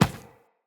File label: Infested Medium Run Default, Footsteps